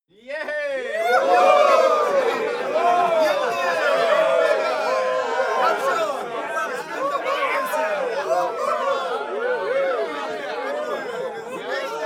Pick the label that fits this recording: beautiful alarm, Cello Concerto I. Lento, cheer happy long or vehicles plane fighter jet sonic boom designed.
cheer happy long